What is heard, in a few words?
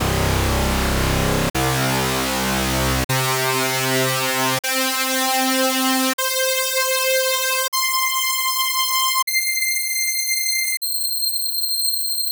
noise saw